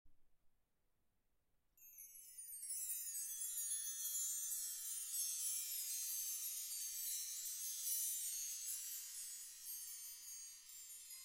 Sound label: brass